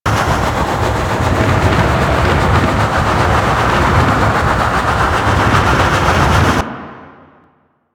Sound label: Lift Transfer Shockwave Loop; Warframe; Focus; Naramon